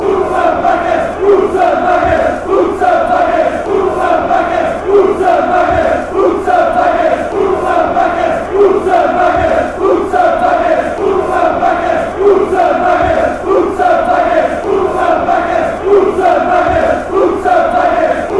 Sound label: chant, fanchants; beerschot